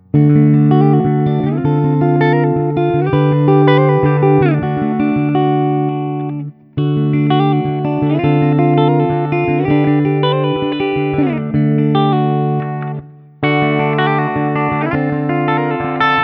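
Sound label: Guild X175 ODS100 D finger, guitar; 1983 Guild X175 guitar; blonde jazz box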